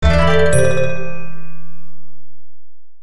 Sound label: Chinese instant message; Nokia; audio themes